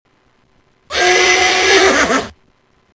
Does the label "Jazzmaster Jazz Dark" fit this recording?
No